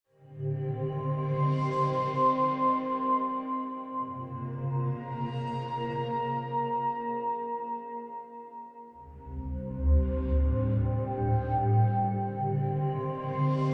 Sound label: spa music